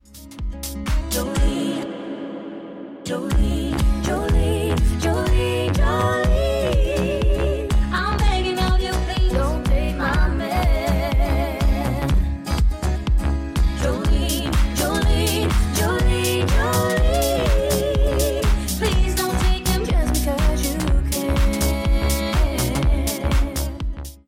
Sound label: BEAT